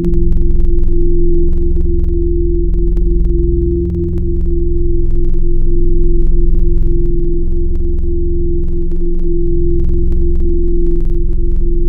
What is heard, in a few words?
Keys Real Organ; Synthetic's Serum Collection; ESW X Keys